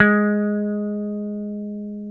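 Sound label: guitar, Dan Electro, 6 string; Dan-O 6 Str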